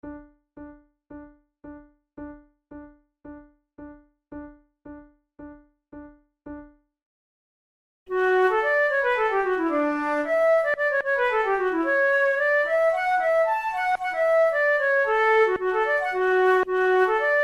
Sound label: duet